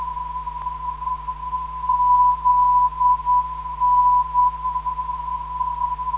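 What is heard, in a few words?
ZN 375kHz, non-directional beacon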